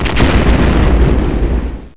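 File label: huge explosion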